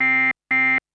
low fuel; lander

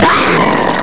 GROWL

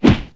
whack, impacts

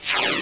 ufo

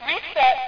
reset

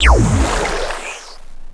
man O War Build; NETSTORM

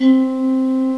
Lead 3 (C3); X-Wing; X-Wing Patches

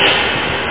saucer fire; asteroids2

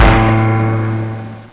infilt point

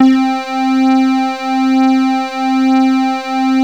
Square Wave, Synth lead; gmplay; gmtones

